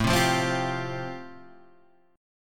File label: A-Minor 7th Sharp 5th, Guitar Half Step Down, chord; Am7#5